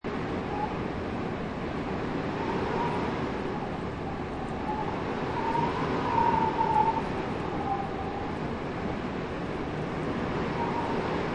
Ice Cold Wind, Ice cold, howling wind, blustery wind whistle; with very slight leaves rustling; Eerie, lonely wastelands or wintery mounta; Outdoor; Lynne Music